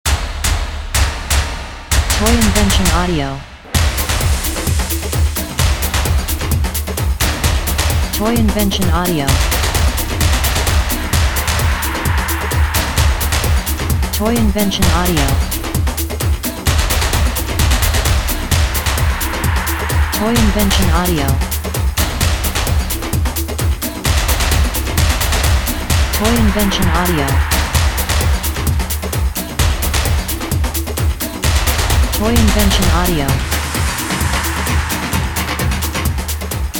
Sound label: brazilian samba groove